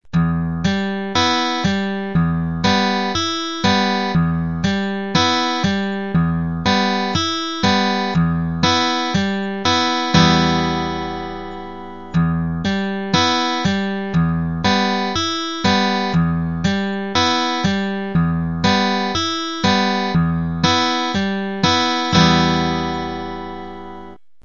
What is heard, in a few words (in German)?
Tonleiter